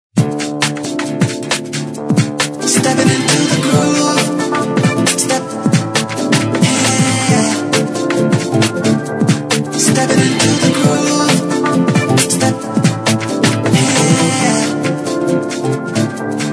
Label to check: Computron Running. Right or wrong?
Wrong